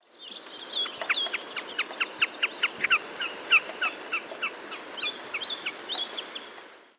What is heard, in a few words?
common snipe gallinago gallinago